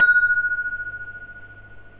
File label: glass